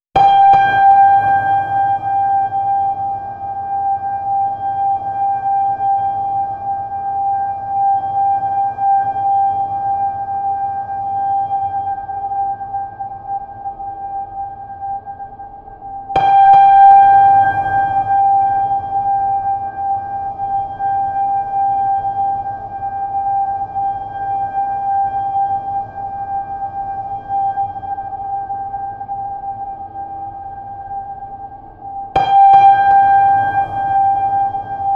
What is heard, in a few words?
ventilator